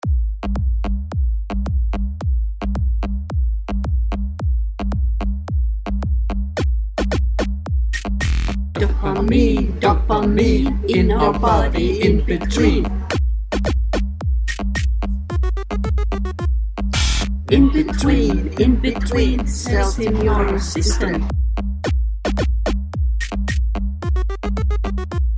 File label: Song with guide vocals